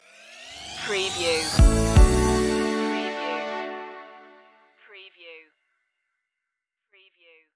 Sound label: a perfect pitch stinger